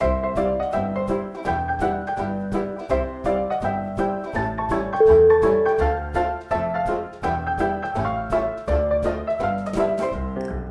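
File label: tapping the rhythm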